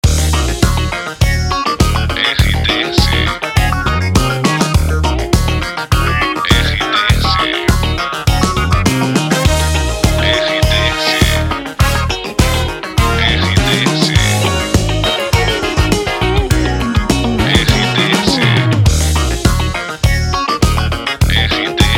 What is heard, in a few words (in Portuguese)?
trilha para locução educativa; Inatel; Difusora FM, Nortelândia/MT